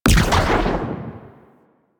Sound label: Fire Trail Teleport Boom; Warframe; Warframes; Nezha